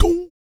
VOC PERC, Vocal Planet Jazz FX BASS PERC

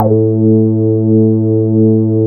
RESO A1, BASSES; Best Service Alpha Dance vol.2

